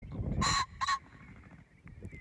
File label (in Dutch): fazant geluid; veerwild en haarwild